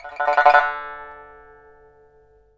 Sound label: SAN XUAN, D4; Spectrasonics; Heart of Asia